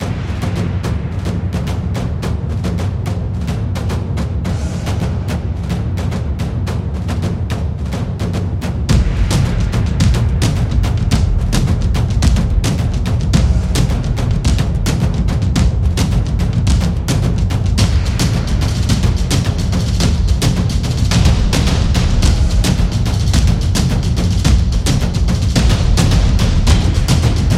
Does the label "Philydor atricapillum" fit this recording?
No